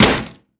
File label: bolt metal, material; STALKER; IWP